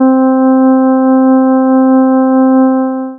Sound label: reed